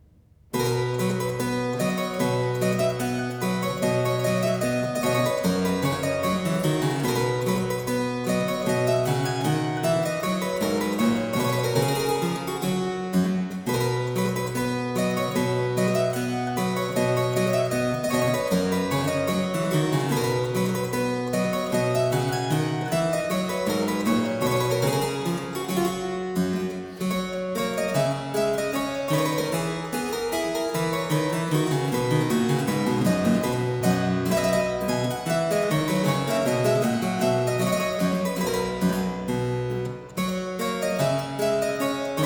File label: March, harpsichord; Complete Chamber Music; Brilliant Classics; Miscellaneous pieces for the harpsichord